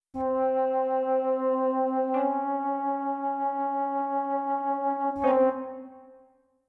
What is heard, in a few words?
flute